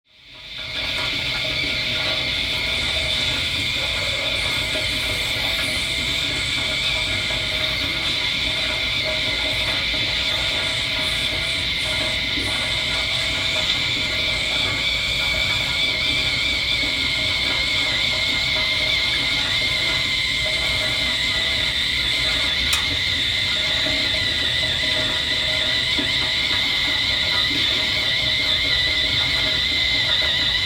RADIATOR STEAM WHINE

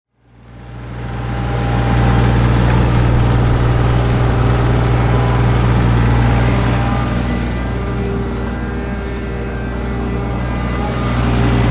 TRACTOR FRONT END LOADER

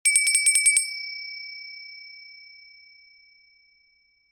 BELL DINNER

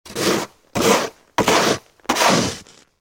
SHOVEL PLASTIC

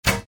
ARCHERY

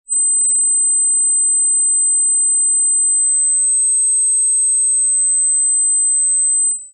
ELECTRONIC FEEDBACK

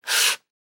sandpaper stroke

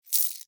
Coins Drop Transfer Hands

